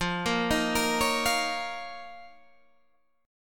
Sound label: F 7th Suspended 4th Sharp 5th F down Guitar Half Step Down; F7sus4#5